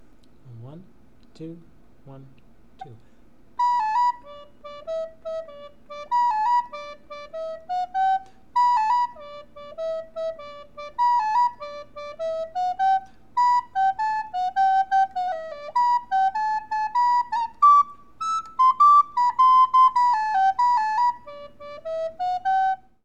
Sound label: north skelton sword dance